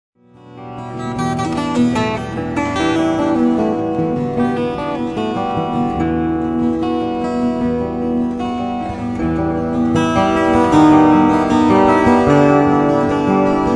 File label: string bazar